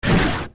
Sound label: katana slash